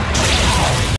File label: slash; sukuna